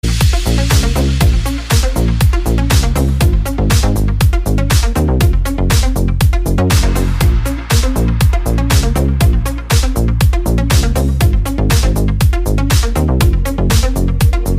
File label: electro